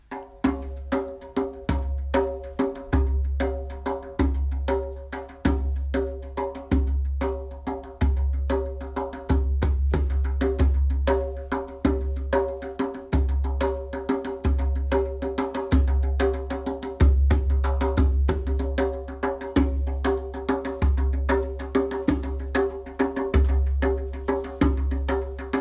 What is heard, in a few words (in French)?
tambour